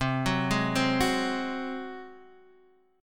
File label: F sharp Suspended 2nd Flat 5th C, Chord